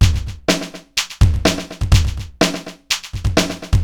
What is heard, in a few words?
80s delay Akai XE8 (125BPM), cyborg beats